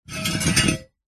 foley metal heavy weight drag scrape solid hard ground